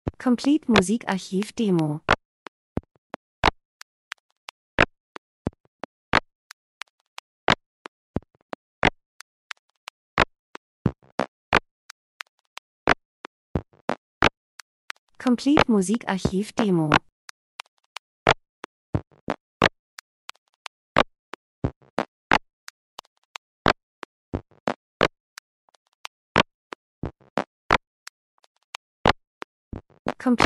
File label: drums; Elektro Rhythmus Verfremdet Marschieren Menschen Zeitgeschehen Ablauf Maschine Industrie Dokumentation